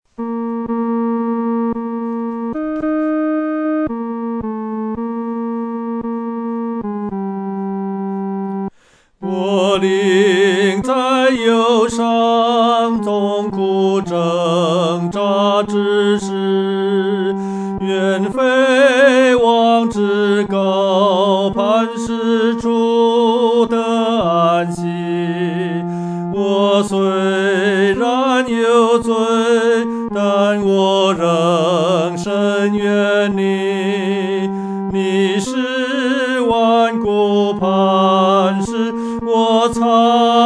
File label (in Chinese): 独唱 第三声